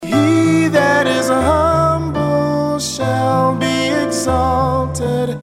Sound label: text tone